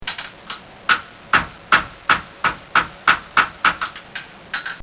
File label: Blacksmith Shaping Horseshoe; Animals